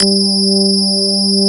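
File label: JV electric piano; Pro-Rec - Synthsonic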